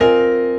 CHORD R, Drum & Bass 140 BPM

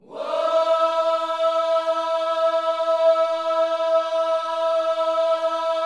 WHOA, Gospel, Vocal; WHOAS; ILIO; Vocal Planet

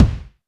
PUNCHY, KICKS

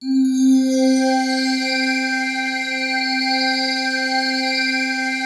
PAD, DIGI-PADS

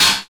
CYBER KIT, DRUMS

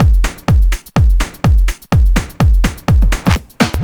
Techie Bell, Drum Loops, 125 BPM; Sonic Foundry (Sony Creative Software); Underground UK House & Electro

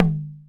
EQ Perc, Percussion Mix

